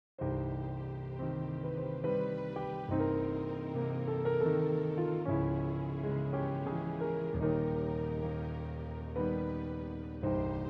Half Waltz

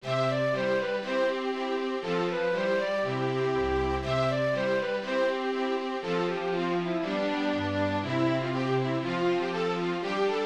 ensemble

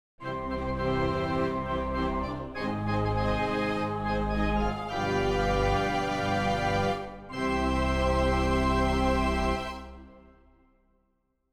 Fanfare Full

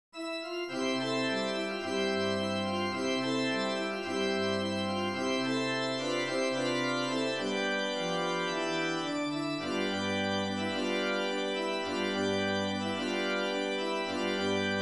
Italian org

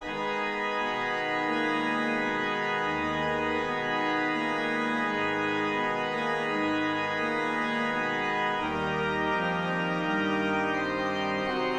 Organ

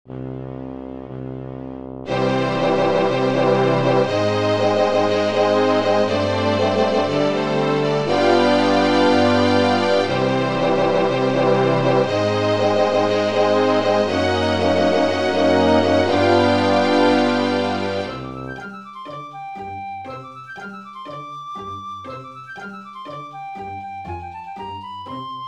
Decadent Reel Orchestral

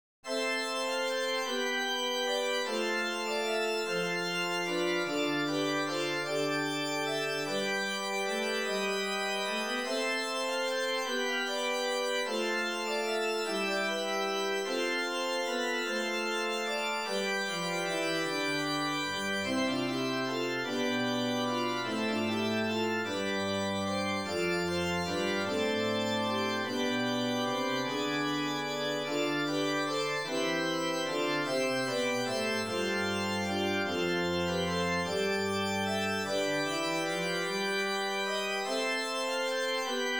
Waltz

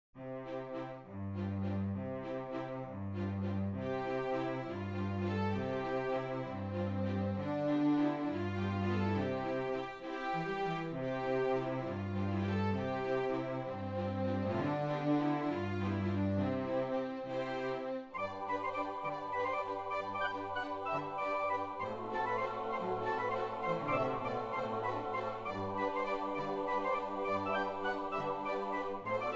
Waltz Christmas

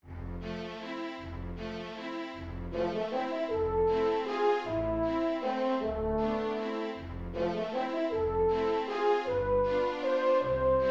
Waltz Orchestra

